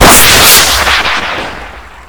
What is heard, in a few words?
sam site fire